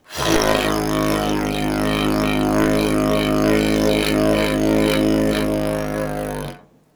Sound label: didgeridoo